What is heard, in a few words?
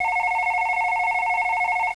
ring